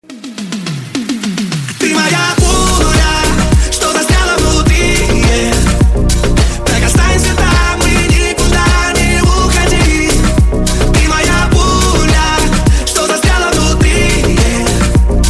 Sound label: techno remix